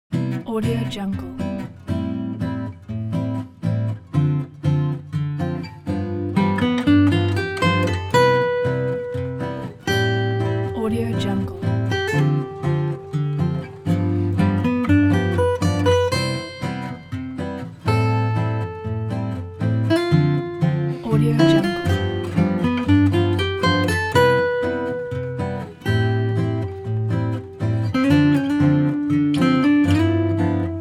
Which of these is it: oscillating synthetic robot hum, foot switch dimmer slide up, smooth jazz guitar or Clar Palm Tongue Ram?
smooth jazz guitar